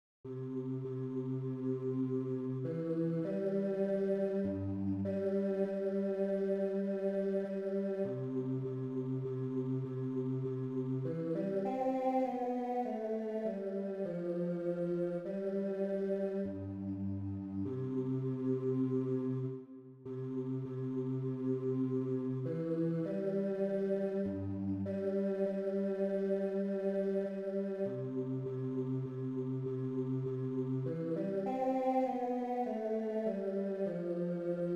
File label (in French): basse; Tibie paiom